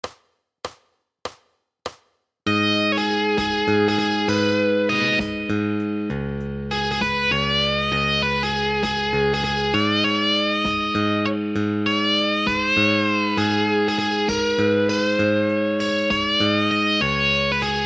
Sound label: Style Solo